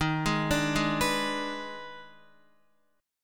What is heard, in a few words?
Eb Major 7th Suspended 4th Sharp 5th Eb, Guitar; arpeggiated; EbM7sus4#5